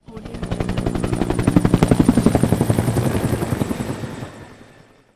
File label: helicopter flyover